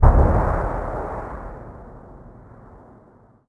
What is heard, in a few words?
hit far; World of Tanks Blitz